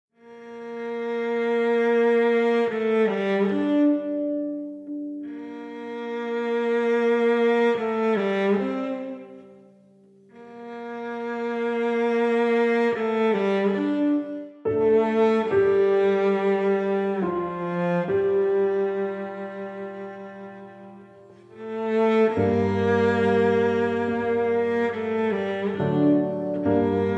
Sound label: Duet for Cello and Piano; star trek